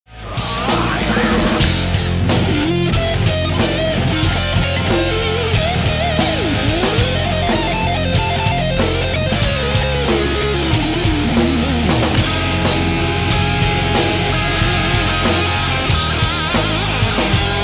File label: solo